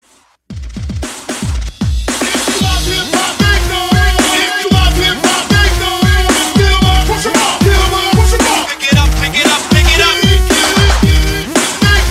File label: HipHop 2000s; Transition Breakz